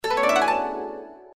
multiplier increment; Fortune God

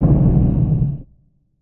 spin start; Hungry Shark; Wazdan

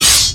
multiline win; In The Forest; Wazdan; claws

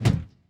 spin stop; Wild Guns

